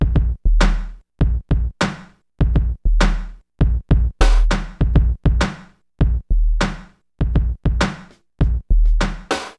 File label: DRUMBEA